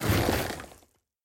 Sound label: building completed